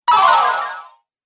Ice Shard Spell, Magic Sound Effects

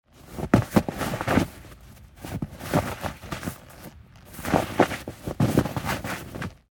Weather Snow Movement

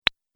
Idea Single Pop, cartoon; Cartoon Sound Design Sound Effects

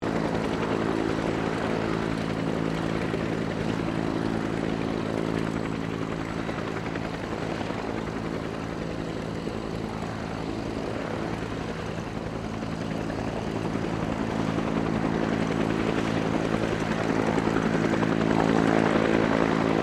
HELICOPTER 1967 BELL UH1 IROQUIOS HOVER DISTANT; RAN UH-1 Iroguios; Helicopters (Sound Effects:Vehicles)

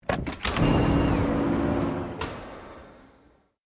Door Servo Automatic Open; Created door sound; Miscellaneous Doors

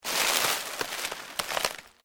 LEAVES DEAD MOVE, Rustling; Material components for constructing more complex sounds; Objects

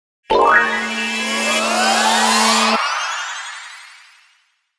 Logo Ascend Swells Shimmer Twinkle; Production Element Imaging Element Accent Transition; Sonic Logo; 5.1 STEREO MIX; Blastwave

